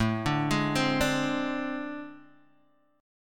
A Minor Major 7th Double Flat 5th A Guitar Standard, chord; AmM7bb5 arpeggiated; 5,5,6,5,3,x